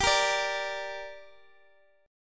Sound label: G Minor G down Guitar Open G, chord